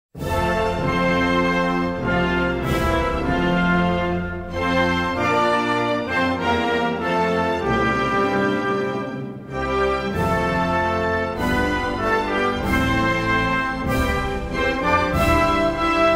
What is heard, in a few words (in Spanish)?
Himno Nacional de Nauru